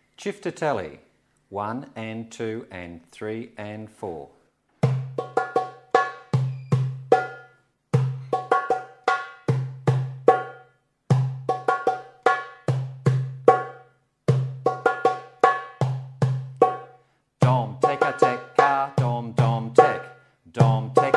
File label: Basic Chiftitelli